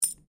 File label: COIN PENNY SINGLE DROP HAND; Common coins; Money; Objects